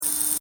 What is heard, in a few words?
Toy robot move loop, Toy, Small: Robot Move Effect Loop; Miscellaneous Toy